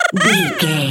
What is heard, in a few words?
goblin fairy expression emote